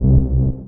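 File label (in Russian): кнопка цифровой низкий мелодичный долгий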